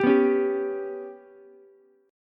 G Major Flat 5th Ukulele, chord; GMb5 strummed; JGuitar

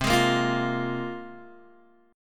C 7th Suspended 4th Sharp 5th, Guitar, Open G

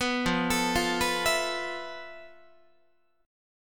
F Major 7th Flat 5th Guitar; arpeggiated

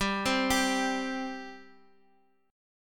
C 5th, G

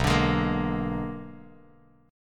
B-Minor Major 13th, Bass Standard; BmM13; strummed; 7,17,18,15; JGuitar

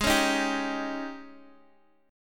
Ab Major 7th Suspended 4th, chord; 13,13,11,10; down; 4G,5C,5E,5A

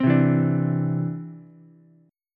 C Major Add 9th, Ukulele; strummed; JGuitar

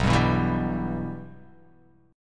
B Suspended 4th Sharp 5th B; Bsus4#5 strummed; JGuitar

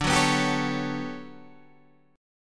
G Major Sharp 11th D, chord

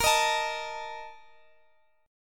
A sharp Major 7th Suspended 4th

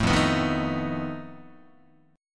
F 7th Flat 5th F down Guitar; F7b5 strummed

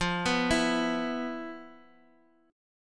E 5th F; arpeggiated; chord

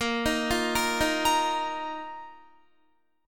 Bb Suspended 4th, Guitar; arpeggiated; 4E,4A,5D,5G,5C,6F; 18,18,15,15,15,17

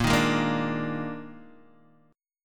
Eb Major 7th Suspended 4th Bb

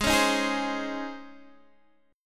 C sharp Major 7th Suspended 2nd G sharp

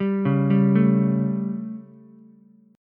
G Suspended 2nd Flat 5th G Ukulele; Gsus2b5 arpeggiated; JGuitar

